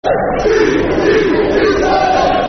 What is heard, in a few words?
Sea Siders [Football Chant (Soccer Song)]; Blackpool Bfc